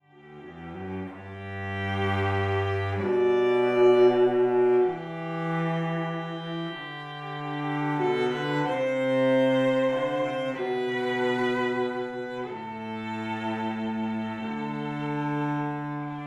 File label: string duet